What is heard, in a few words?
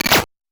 Noise A, 8-bit, Bitty Noises; musicradar; 8-bit bonanza